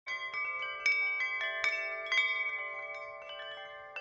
Short Chime Wind

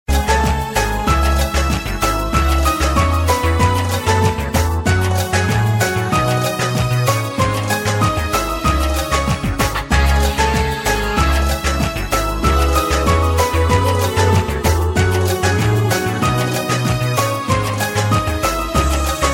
Beautiful Music

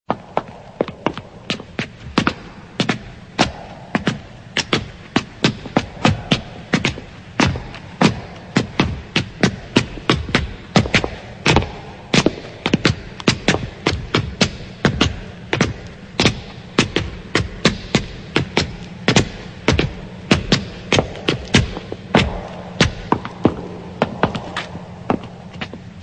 Footsteps Tones

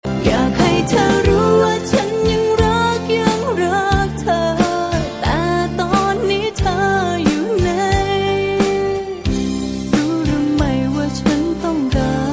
Thai Love Song

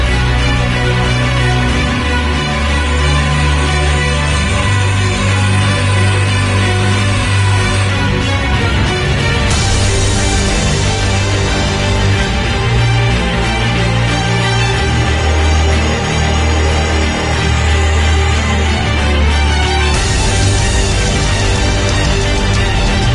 battle; T7; Kazuya

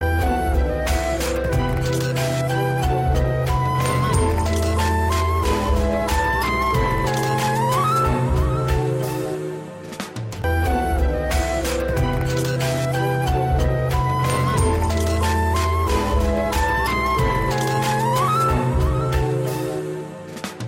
Flute Ring Tone

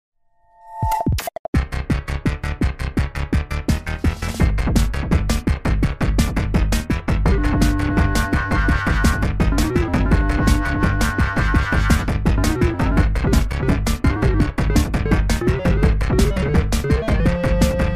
Chamber Dungeon

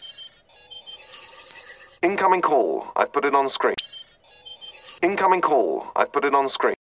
Incoming Call

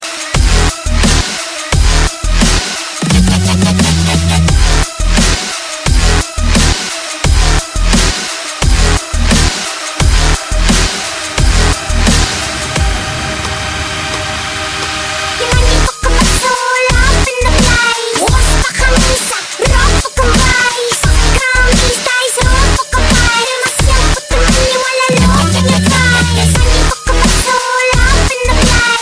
Dubstep

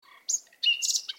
Bird Song

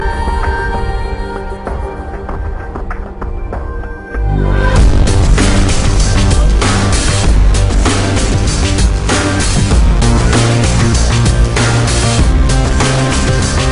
27 Viollins

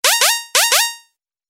Message beeps

